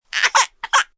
Cry Baby Short, Cry, baby, short gasp, pain, hurt, sad (human voice); Crying Sound Effects